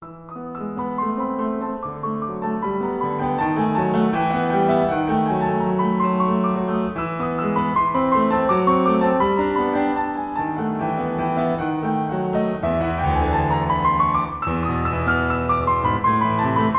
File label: melody